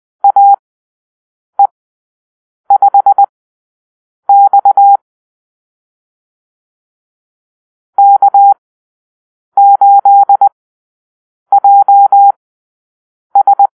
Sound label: Call-Sign Drill, morsecode